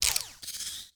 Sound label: battery